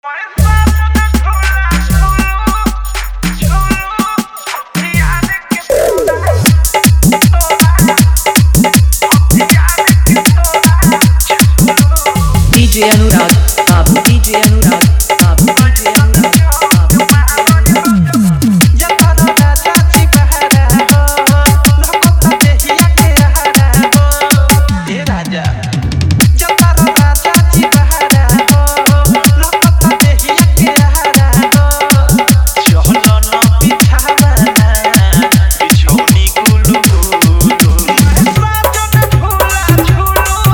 New Bhojpuri Song Fully Dhollki Bass Dance Mix

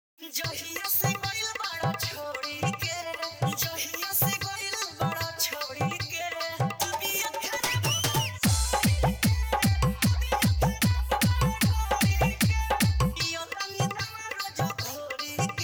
full bass dance Mix

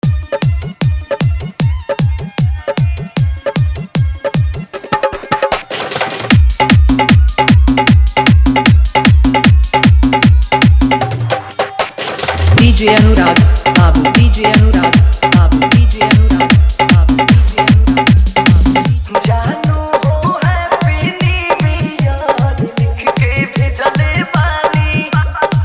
Romantic New Year Dj Song Hard Dhollki Bass Remix

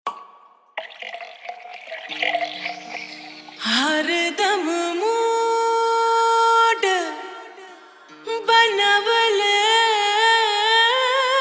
Dhollki Bass Mix

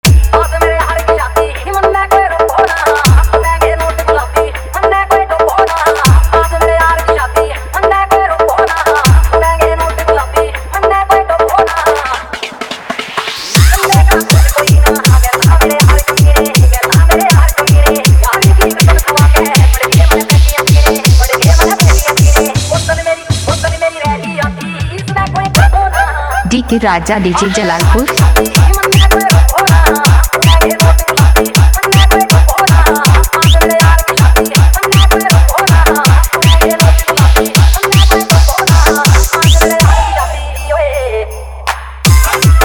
FULL FAST GMS BASS

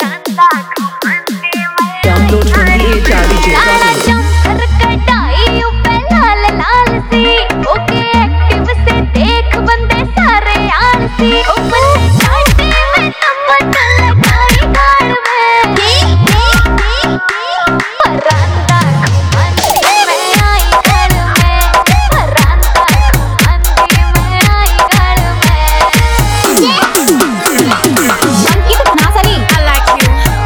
Haryanvi Dj Dance Full Remix; Haryanvi Special

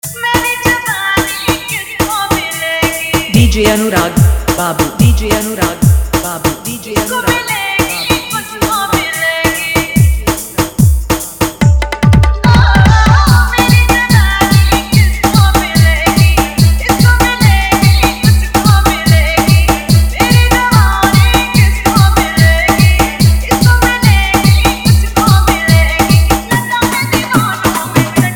Hindi Love Bass Hard Jhan Jhan Remix

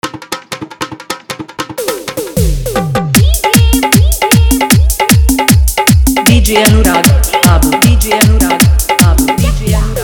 Arkesta Hard Dhollki Bass Mix

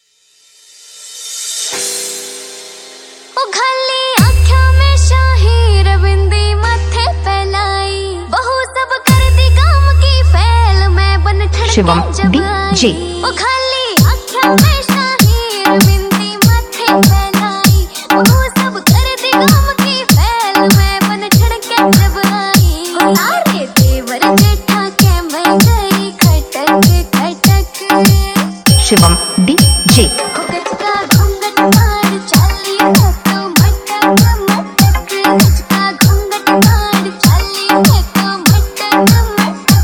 Dj Mix Song

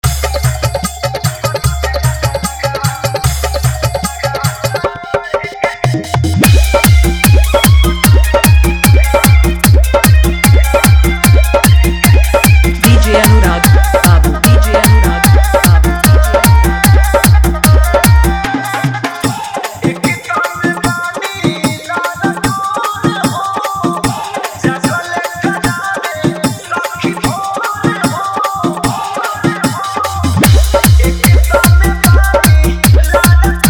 Faadu Dance Mix

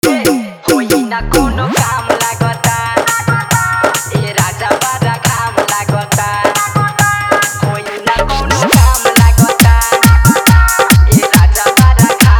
Viral Trending Hit Bhojpuri Hard Dhollki Bass Mix

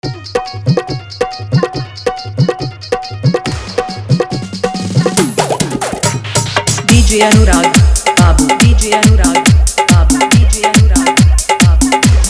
New Bhojpuri Dj Song Fully Dhollki Bass Mix

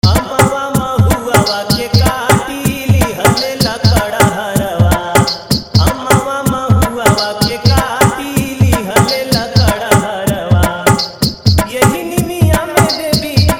Old Bhakti Faadu Mix

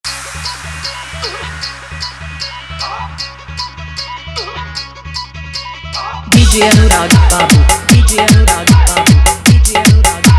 Full Dhollki Bass Rod Dance Mix

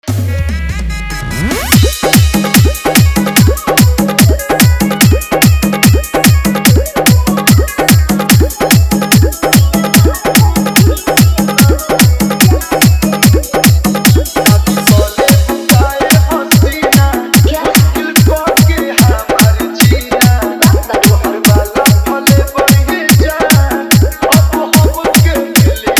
Full Dhollki Mix

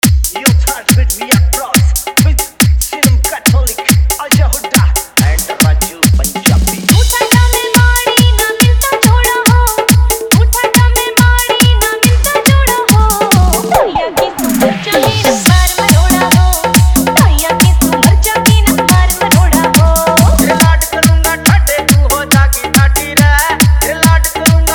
Hariyanvi Dhollki Bass Dance Remix

